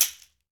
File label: Latin Shakers, mexican